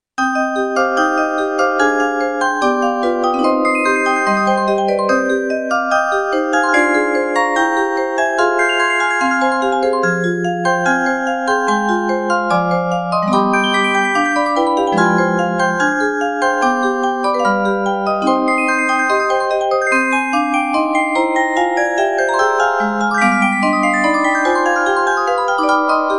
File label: Hungarian Dance no.1, Hungarian dance no.19, Hungarian dance no.5; J. Brahms